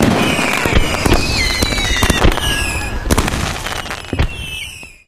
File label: summer fireworks; Source Engine; tf